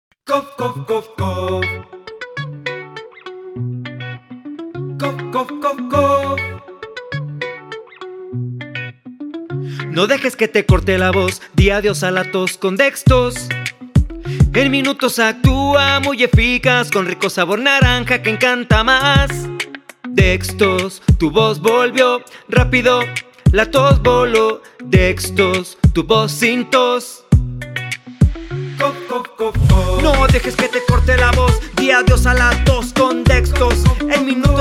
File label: Jingle; Dextos Tu Voz Sin Tos; Cornea Creativa